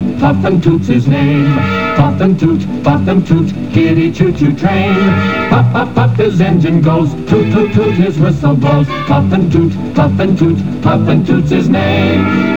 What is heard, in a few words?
puff and toot